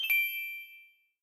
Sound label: general bonus reveal money; common bonus